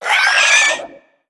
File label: death, headcrab, creatures; headcrab classic; vox